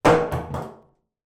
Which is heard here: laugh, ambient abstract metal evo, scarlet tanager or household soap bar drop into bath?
household soap bar drop into bath